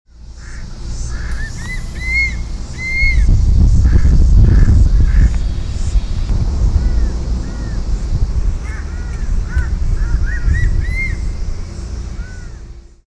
kanmuriwasi

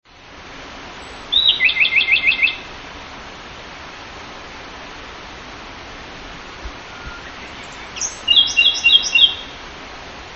hontouakahige call; yanbaru

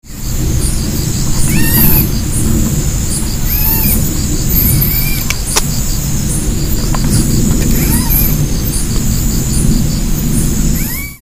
kenaganezumi call

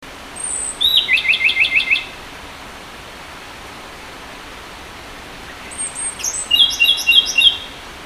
hontouakahige

